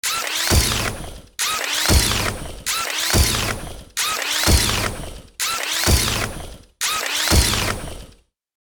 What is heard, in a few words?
Robot tactical unit footsteps walking; robotic